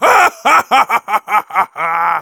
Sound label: laugh happy, de; Demoman